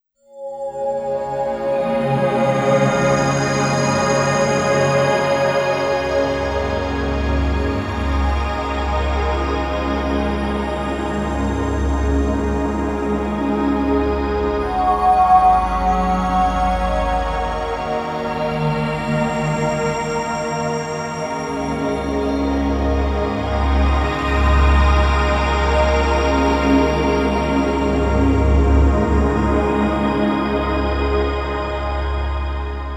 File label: Pad Swell